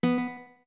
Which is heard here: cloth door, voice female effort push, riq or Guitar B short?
Guitar B short